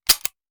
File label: Hand Gun Foley; Sound Ideas; Guns